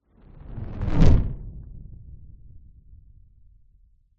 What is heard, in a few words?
nature fireball pass by large designed